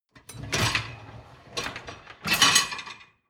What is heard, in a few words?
dishwasher lower tray draw out; maschinen; spuelmaschine